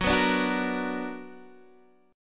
B Minor Major 7th

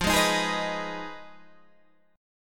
F Minor 7th Flat 5th F down Guitar Standard; Fm7b5 strummed; JGuitar

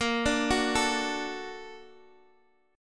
A sharp Augmented Major 7th, Guitar Standard; arpeggiated; x,x,8,7,7,5; chord

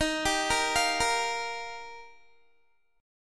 Eb Major Add 9th, Guitar; Ebadd9 arpeggiated

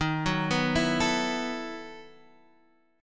B 11th D sharp, chord

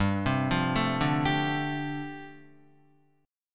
G 7th Suspended 2nd Suspended 4th G, Guitar; arpeggiated; 2,3,5,2,2,3; 4F,4A,5C,5G,5C,6E

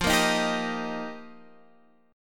F 7th Suspended 2nd Suspended 4th; F7sus2sus4; strummed; x,8,8,8,8,11

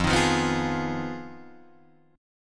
F Minor 7th Flat 5th F down Guitar Drop D

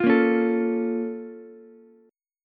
Bb Minor Major 7th Bb down Ukulele, BbmM7 chord; strummed; JGuitar

